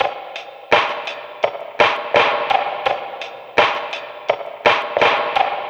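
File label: Down Town Lo Fi 084, Lounge Drumz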